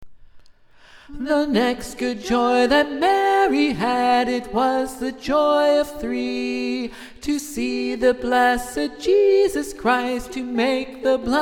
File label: Alto; The Seven Joys